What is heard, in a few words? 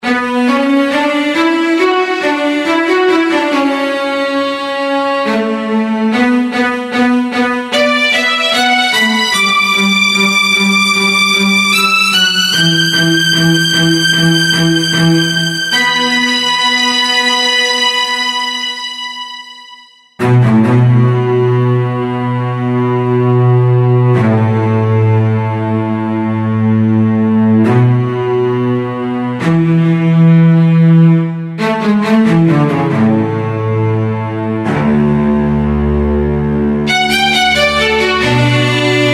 strings; HALion6 : Studio Strings; GoldenDays